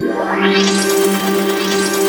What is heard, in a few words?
PYRO; NorthStar - The Wizard VOL-2; THE VOID